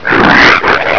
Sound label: pain